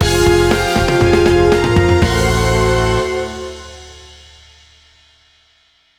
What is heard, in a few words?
Lose; Level 3 Phase 2